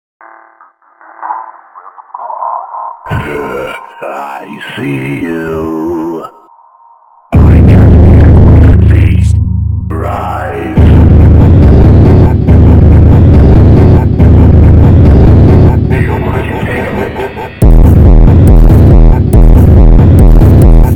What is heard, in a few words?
Funk, Superbass